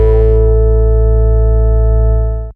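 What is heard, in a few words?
synthesizer Dance; Best Service Gigapack 1; SYN 06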